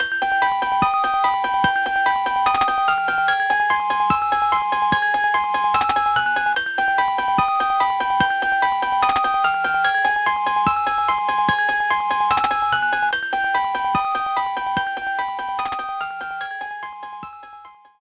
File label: World 4 Map; SMA4; World 6 Map